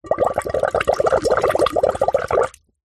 household water bubbles through straw into metal pan